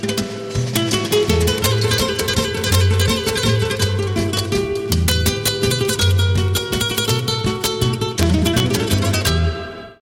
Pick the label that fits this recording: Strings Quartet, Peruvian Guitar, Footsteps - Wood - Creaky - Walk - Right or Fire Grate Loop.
Peruvian Guitar